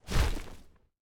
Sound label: wing flap; smite; horus